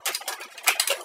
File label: slide click